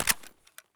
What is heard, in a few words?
sv98 jam; Escape from Tarkov